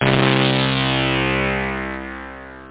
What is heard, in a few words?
analog syn; OctaMED SoundStudio V1; jazzies samples